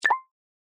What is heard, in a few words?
notification good